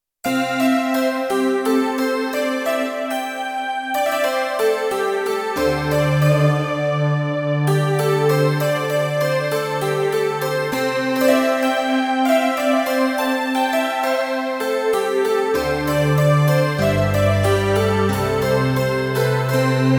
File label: Asialon, Roland D-70; Factory Performances